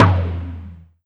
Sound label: PERC TOM FX; Big Beat Samples & More; AKAI